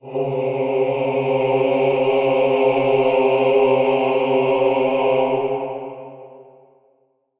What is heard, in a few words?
Kuva Lich Requiem Mod Choir A